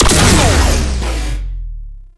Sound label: Last Shot, Infested Arm Cannon, Weapons; Warframe; Coda Bubonico